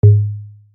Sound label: Keypress Invalid; INOI 2 Lite 2019